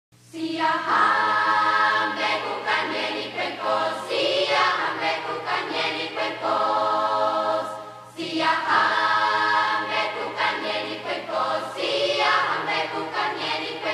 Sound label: Children s Choir